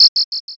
whistle short

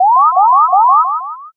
scan

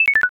score down

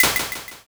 egg crack

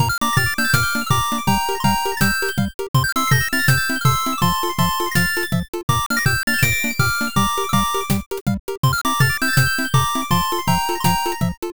bgm bright

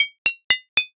chain

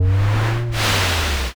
dragon breath

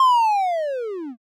slowmo

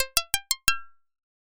pluck

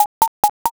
ladder

